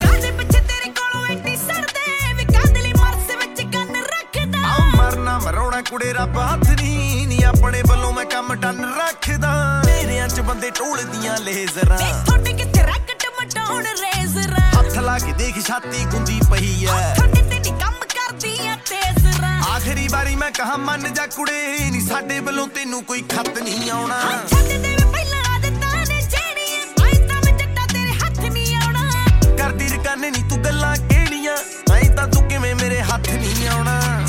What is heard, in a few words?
Song Ringtone